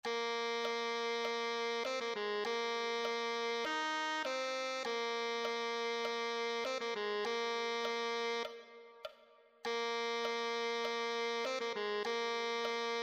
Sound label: tenor saxophone